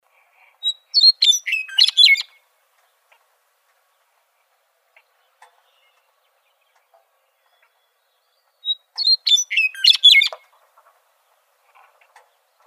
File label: Icterids; AZFO; deviche